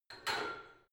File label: barbell heavy plates lift rack reverby; sport freizeit; fitnessstudio